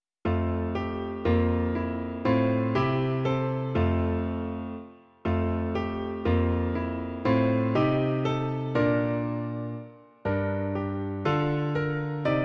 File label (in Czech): klavír; Klavírní verze